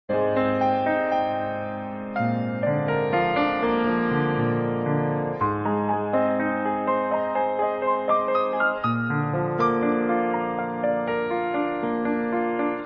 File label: Piano Live; Cybernoid 2